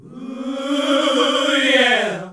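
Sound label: Gospel; Best Service Gigapack 1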